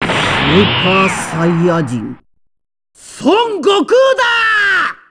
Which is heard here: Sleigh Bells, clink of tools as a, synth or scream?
scream